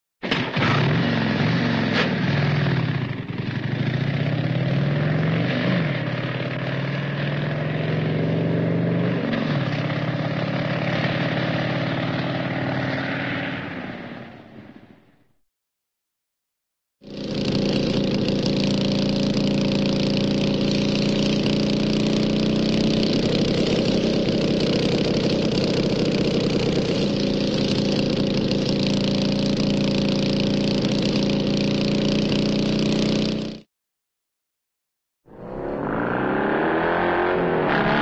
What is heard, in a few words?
motorcycle start and pull away; cartoon